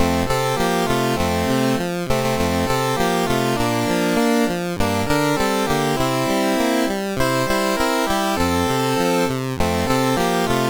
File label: Jingle Bells, CSG8580R5